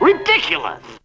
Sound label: toon; Zero G - Man Machine